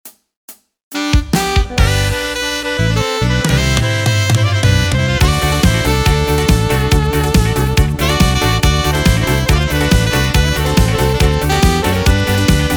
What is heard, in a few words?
Midi Yamaha; yamaha tyros 4